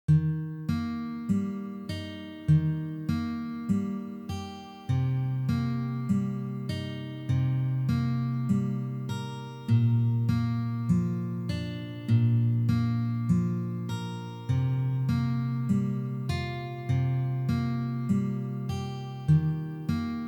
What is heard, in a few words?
Ornamentation Big Song Slow